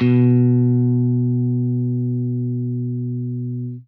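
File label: jazz line, guitar; Quantum Leap - Guitar & Bass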